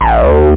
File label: acid